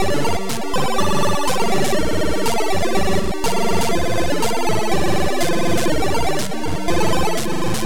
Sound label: intro tune; Kevin Oedipus